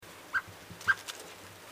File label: Turdus merula; SETE